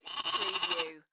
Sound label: Lamb bleating; beanstalk audio